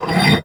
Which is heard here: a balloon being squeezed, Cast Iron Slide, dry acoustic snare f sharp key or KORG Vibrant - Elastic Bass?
Cast Iron Slide